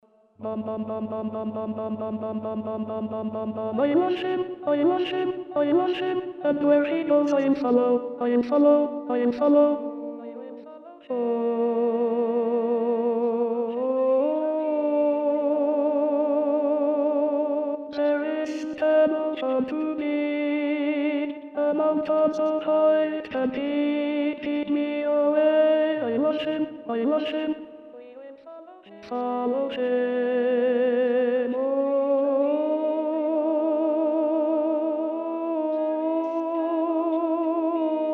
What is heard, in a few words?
TENOR